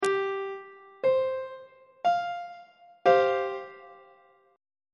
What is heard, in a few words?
c suspended 4th 2nd inversion on treble clef